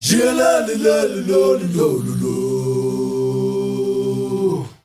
MALE, CHOIRS; MALE GRP; Spectrasonics; Heart of Africa 2

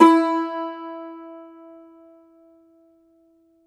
cumbus saz E, plucked strings; E-MU Producer Series Vol. 7 – Old World Instruments

